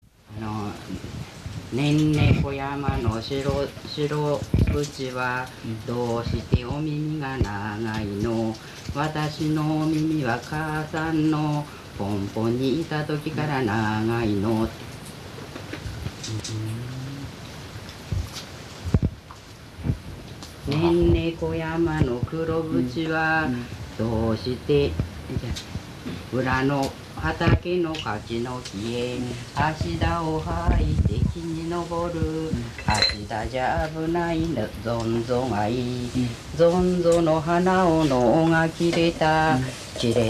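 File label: lullaby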